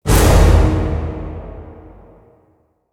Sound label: Orchestra Hit